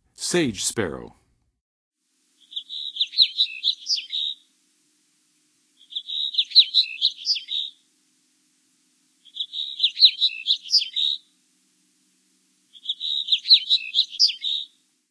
Sage Sparrow, Songs of Birds of North America; Western USA; Western USA Songbirds

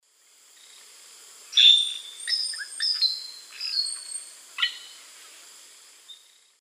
Molothrus oryzivorus, song; S. America; Eastern Lowlands

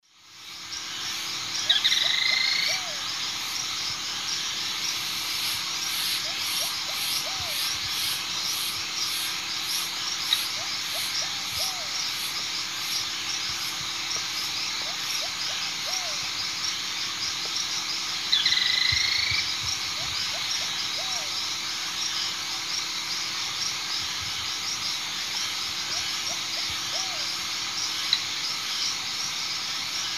Hemitriccus iohannis, song; S. America; Eastern Lowlands

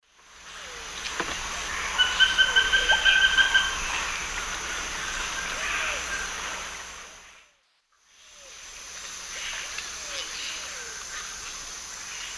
Chrysoptilus punctigula, calls; S. America; Eastern Lowlands

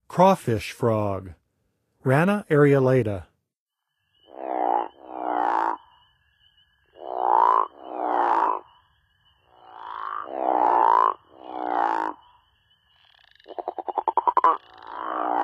Crawfish Frog; North America; Songs of Frogs & Toads of North America

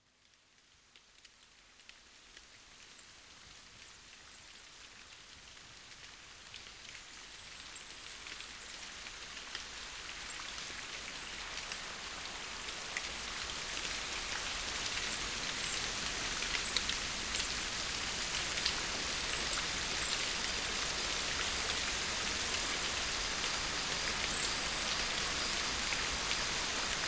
Forest Raindrops

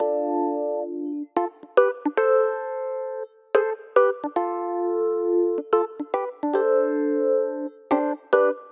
Rhodes; Rock; Keys; Rollerskate Rhodes

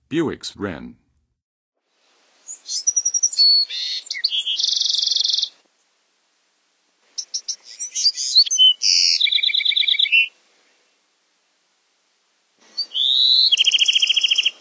Bewicks Wren; North America; Western USA; Western USA Songbirds

